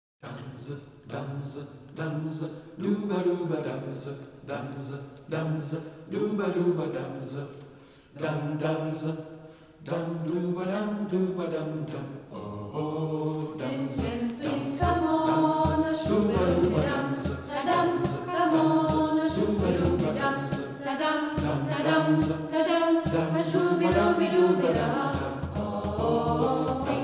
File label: Sing and Swing, Jam session